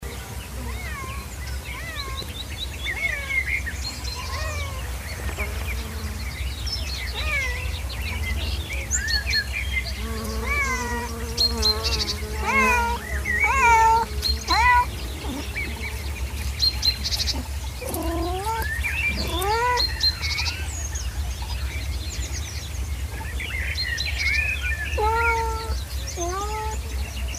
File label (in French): Chat Et Oiseaux